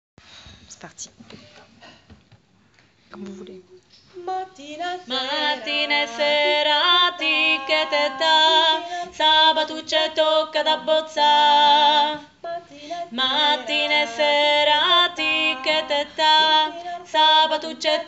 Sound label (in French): Rythmique basse; Cinturini